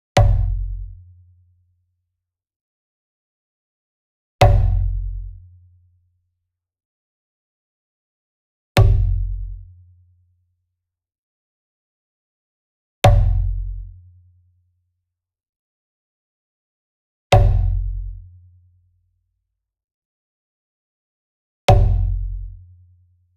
Wood Percussion Big Bom Hit Alternative Beater Near; boom library; Cinematic Strikes Construction Kit